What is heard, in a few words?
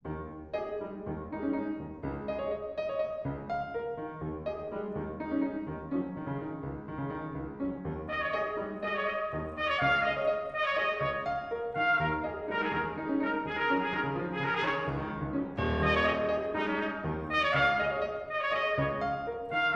Sonata for Trumpet and Piano